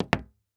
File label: Foley Footstep Hard Sole Dress Shoe Single Step Up On Hollow Wood Surface; Foley Footsteps